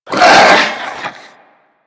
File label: Head Shake; Warframe; Stalker